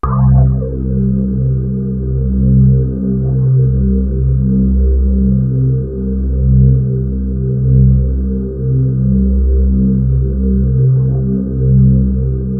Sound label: VOX ORGAN; MORPHEUS; Syntec; Wall of Sounds